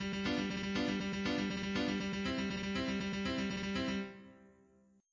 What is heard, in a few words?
rock; orpheus